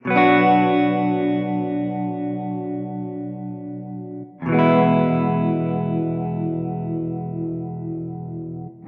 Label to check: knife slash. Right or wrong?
Wrong